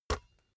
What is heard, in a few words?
basketball catch soft